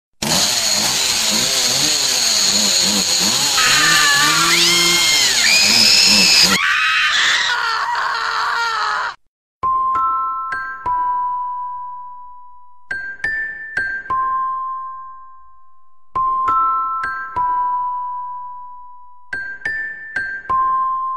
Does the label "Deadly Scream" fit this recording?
Yes